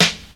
short snare a key, snare drum sound tuned to the A note. Loudest frequency: 3171Hz; drum single hits one shots